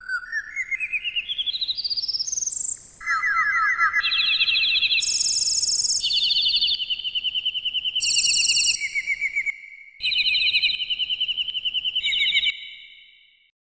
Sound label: crickets; croc; AMBI NGHT